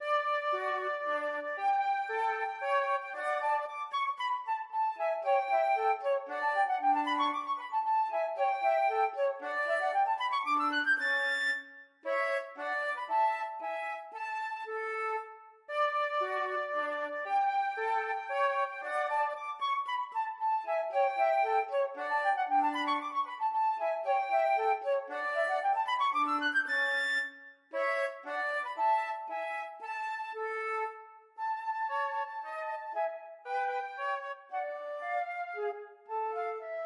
mozart flute duet; k487 no6

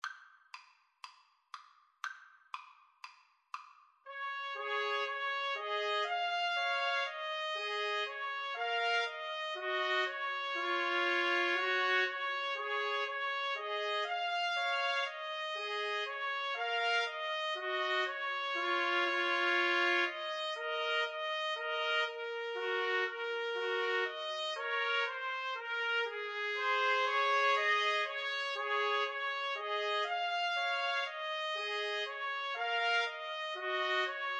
3TPT, Trumpet Trio

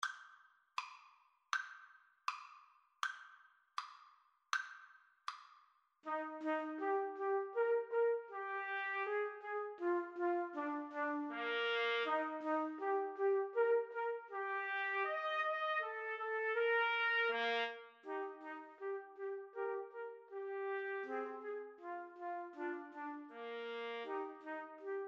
2 Trumpets Trombone

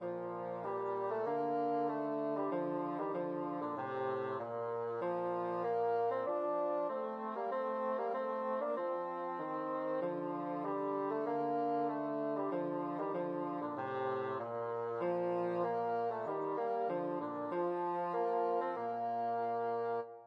Hail to the Chief, bassoon duet

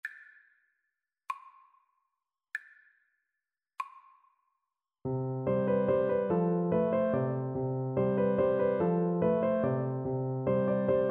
lakme BN, Bassoon

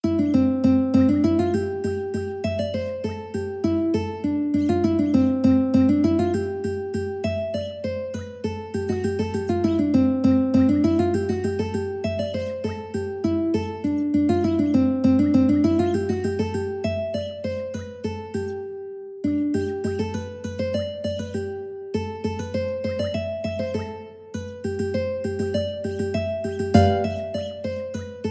ukulele

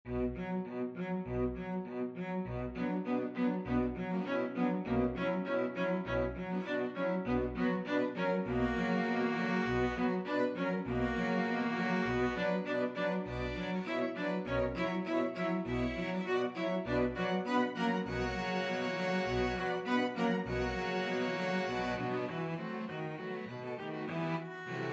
flexible string ensemble 8 players

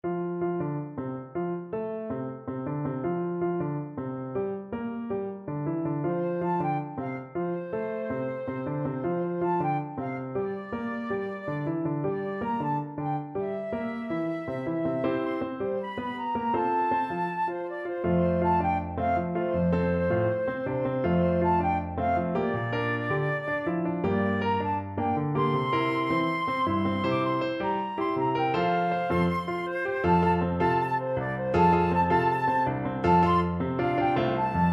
salsa flute

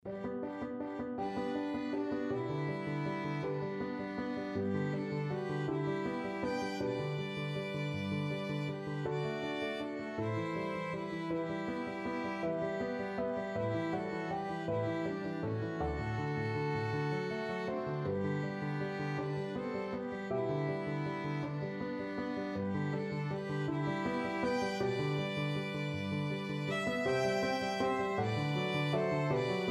puccini beloved father, violin duet

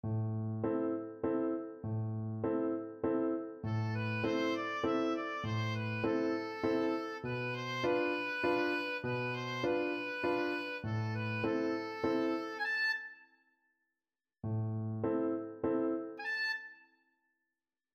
creak crunch scream, oboe

